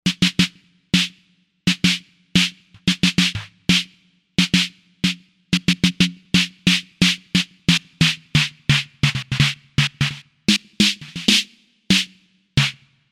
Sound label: Ana Snare; Novation UltraNova; Factory Patches